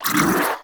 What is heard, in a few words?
Miasma Cast; Warframe; Saryn